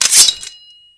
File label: Select Sword